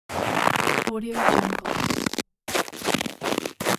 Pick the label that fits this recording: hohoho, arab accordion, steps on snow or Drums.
steps on snow